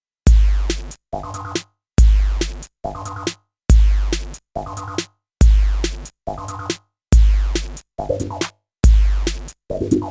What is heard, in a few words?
iPad Special Rhythm